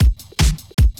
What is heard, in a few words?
drum; Pony Fan Music Remix Stems